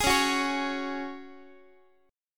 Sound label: C sharp Suspended 4th, Guitar; C#sus4; strummed; x,x,11,11,10,12; 4A,4E,5A,5D,5G#,6C#